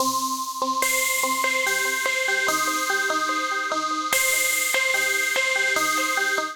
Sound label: Marimba C Minor 73 bpm; MIDI Clips; Tonal; Melodic; Dilla Marimba; Ableton; Factory Packs; Chop and Swing